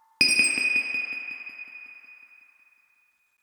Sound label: Reaktor Drop; Inquisitor 3